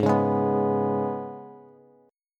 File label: Ab Minor Double Flat 5th down Bass; Abmbb5; strummed